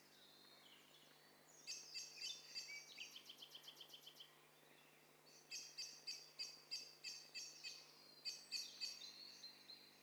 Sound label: STEREO BIRD COCKTAIL PARTY